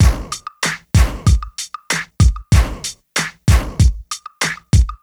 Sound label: Club Tip, R&B Drums